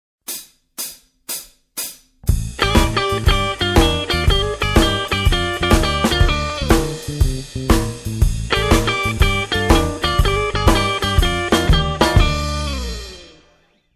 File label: blues jazz